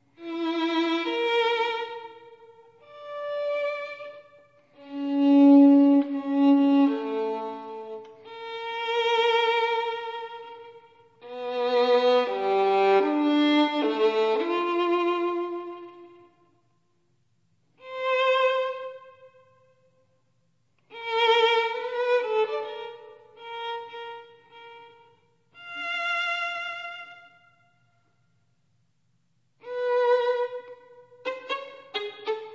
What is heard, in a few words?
String